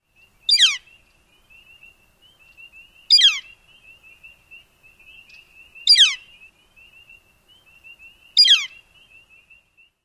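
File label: peough yellow-shafted, Northern Flicker; NY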